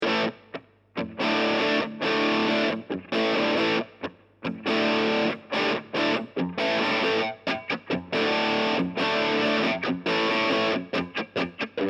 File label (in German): steg knaggs dist